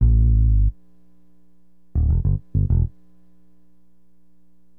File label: BASS, Acid Jazz Funk